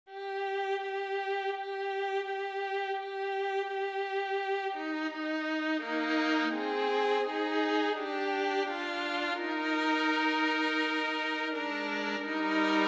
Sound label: There Is a Gren Hill Far Away INSTRUMENTAL